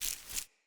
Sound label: Grunt Foley Fill; Enemy; Characters; Corrupted Sproutfolk